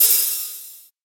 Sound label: Ambient Open High-Hat G Key, Royality free open high-hat sound tuned to the G note.